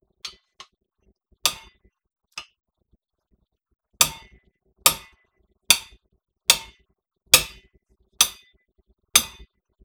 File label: ceramic